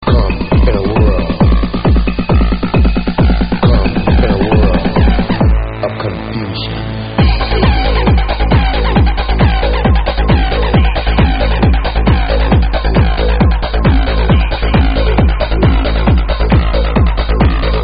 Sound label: confusion progressive